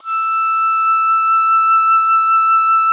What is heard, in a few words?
OBOE E6; Xsample VOL-6